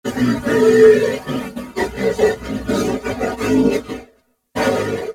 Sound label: Horse Trot Breath; Warframe; Duviri Horse; Vehicles